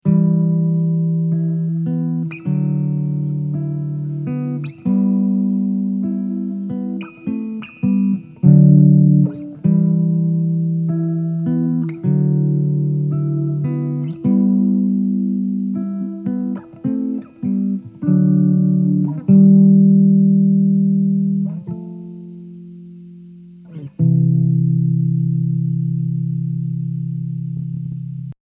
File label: Melancholy Slide